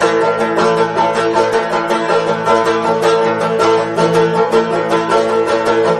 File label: banjo; music; danger